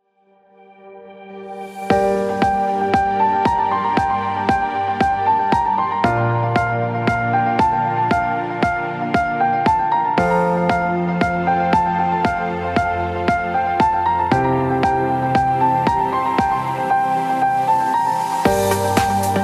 Abstract Pop Electronica

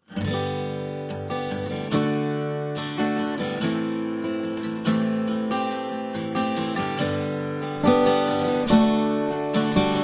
Acoustic Guitar

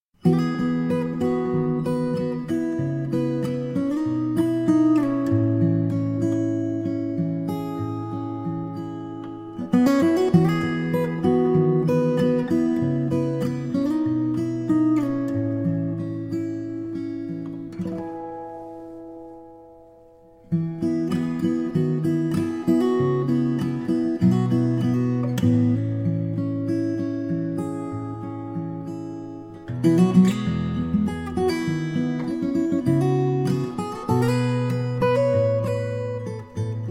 Instrumental Guitar

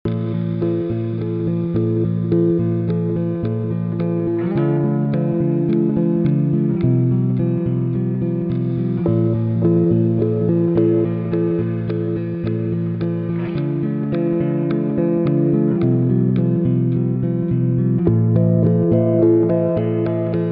Slowed + Reverb

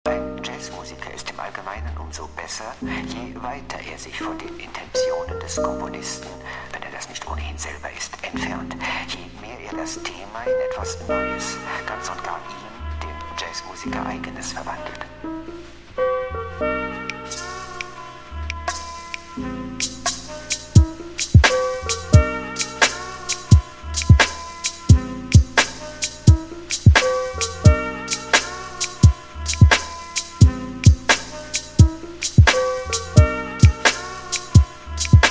Jazz Trip; Jazzy Beats Chillhop Instrumentals Jazz Vibes

